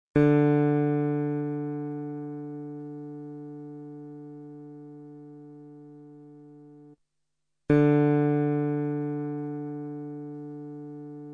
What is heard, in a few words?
Tuning note D; 10 Rock & Blues Solos; De Haske